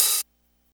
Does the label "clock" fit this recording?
No